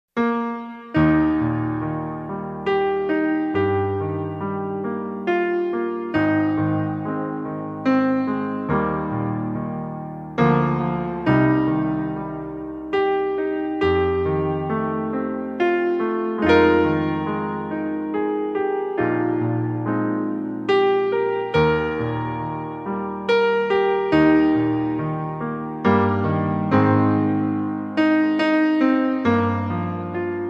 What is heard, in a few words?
amazing grace, Soothing Hymns